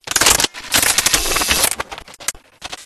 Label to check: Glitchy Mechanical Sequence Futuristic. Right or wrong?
Right